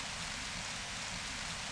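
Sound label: rain loop; lander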